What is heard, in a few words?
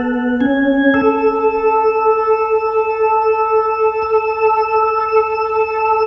Organ Lick; Ambient Grooves; Organs Licks